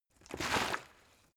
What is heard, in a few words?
bottle water sparse upend; berufe